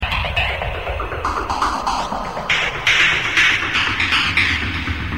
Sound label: ringtone